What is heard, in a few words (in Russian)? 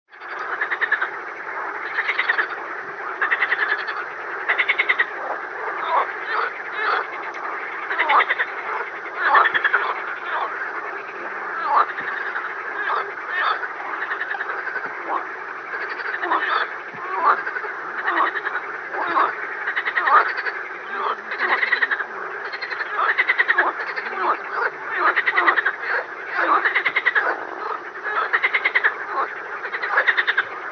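zvuk ljagushek na bolote, frogs in a swamp sound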